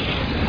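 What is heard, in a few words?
fireball; rott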